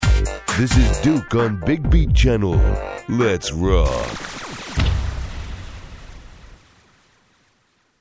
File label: Jingle; Duke Nukem